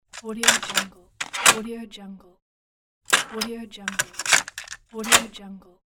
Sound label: cassette tape load remove